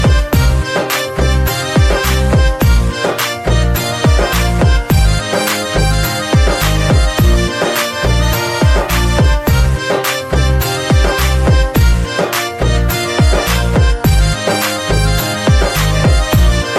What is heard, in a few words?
Music Ringtone